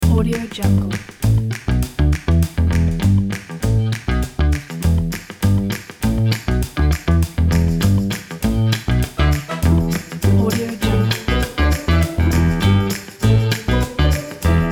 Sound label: jazz kit